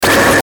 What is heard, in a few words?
Glitch Short Electrical Warble, Glitch Fast Stutter Break; Production Element Imaging Element Accent Transition; Blastwave